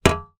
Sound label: industrial shovel metal light impact hard ground; zapsplat